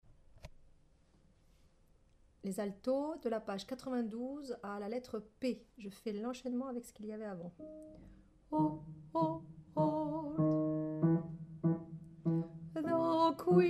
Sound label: Alto; Cold people 2; cold people song kingarthur purcell